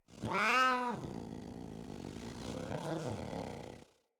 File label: Kavat Vocal Idle; Warframes; Khora